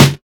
nice snare sample C sharp key, acoustic snare tuned to the C# note